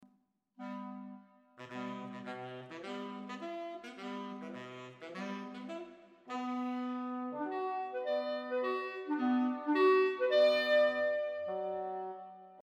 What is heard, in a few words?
sax Bfag Bcl